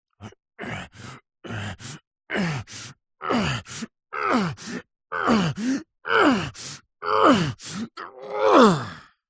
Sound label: human male voice groan work out arm curls increasing difficulty; zapsplat